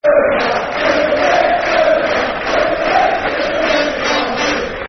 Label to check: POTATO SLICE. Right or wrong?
Wrong